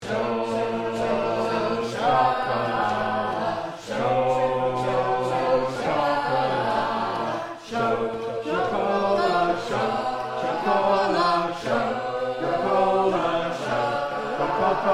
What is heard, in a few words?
chocho cola